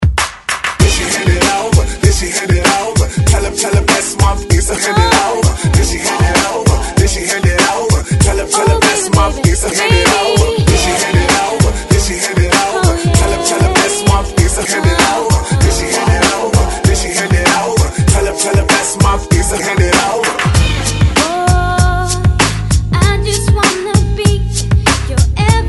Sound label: 90s Hype Re Drum 97 Bpm; DJDOLLS